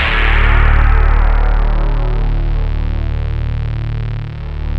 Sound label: MINIMOOG